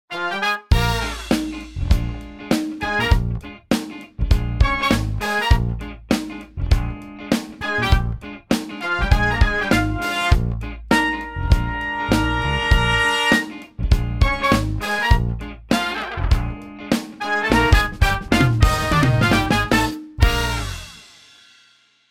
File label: Slow Horn Section; MOJO 2